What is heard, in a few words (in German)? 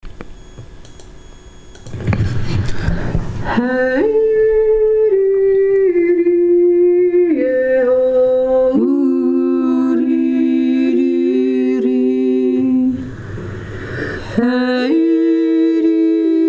sonntagsjodler